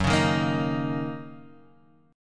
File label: Gb 6th, Guitar, Standard; strummed; JGuitar